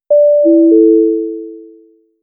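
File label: finish notification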